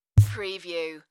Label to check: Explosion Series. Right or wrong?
Wrong